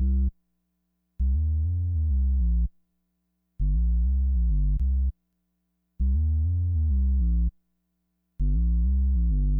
Bass, 100 Cmin

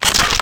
Scorpion, Wavetables; Cymatics; Serum; Animals for Serum - Gold Edition

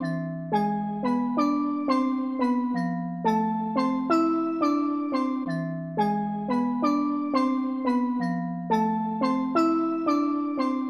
Steel Drums, Hip Hop Exotica, Apple Loops; Big Fish Audio

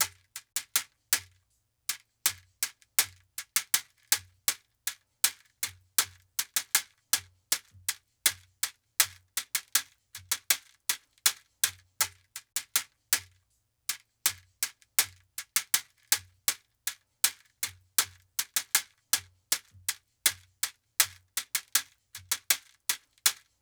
shaker B 080, Hip Hop India; 080bpm; Hip Hop India Modern Percussion; Apple Loops

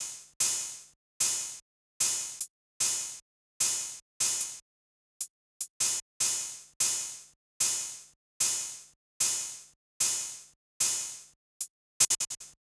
Hats, 075 Bmin, Drum Tracks

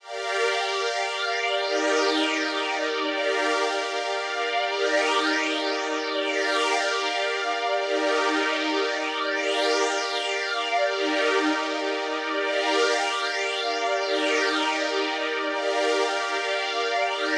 155 BPM G Min Pad; Cymatics; Destiny Gold Expansion; Neon

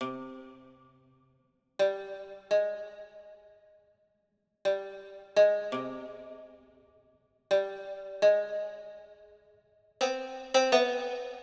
Koto; Hip Hop Exotica; Big Fish Audio

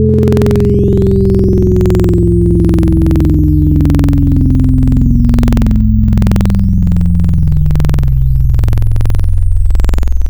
Recorder, Wavetables; Cymatics; Wavetables Collection Vol 3; Serum

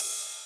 EDM Future Ride, Cymbals

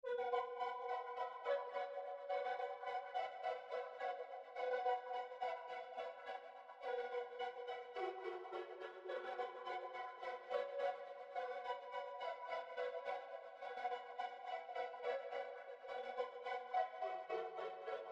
106 BPM B Min Pluck; Cymatics; Destiny Gold Expansion; Exotic

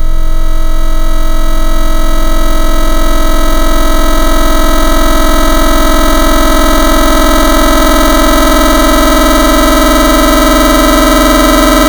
FM Table, FM Wavetables; Cymatics; SERUM

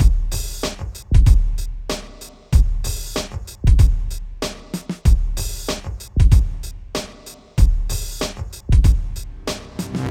Drums, 095 Cmin

